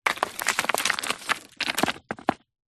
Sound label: foley rock small fall roll down large pile of others; zapsplat